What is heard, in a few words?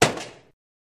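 Gun Shot Single Shot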